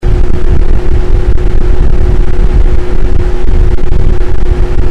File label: Bare Mic High, Shotgun Windscreens; K-Tek